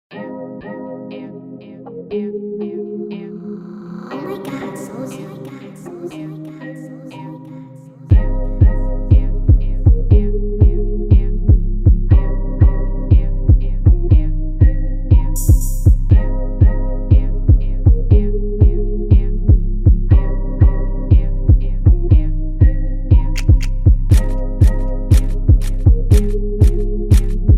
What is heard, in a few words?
instrumental